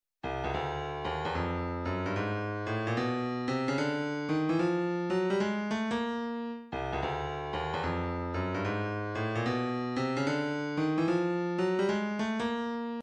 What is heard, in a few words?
148 djembe, midi; Frenetic